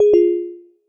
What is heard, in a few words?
player Turn